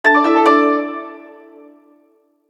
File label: Msg Allegro; Vertu Constellation Quest